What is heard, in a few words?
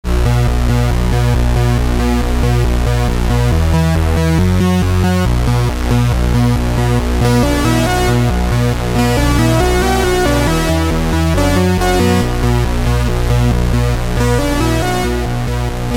midibox sid